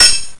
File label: Menu Nav; Afterbern Democralypse; Windows